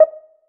paddle impact; Pingk

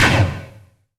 large shot; burutaru

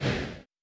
speedup; burutaru

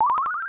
newball

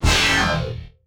super clear; ponponchun

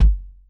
menu push; ponponchun

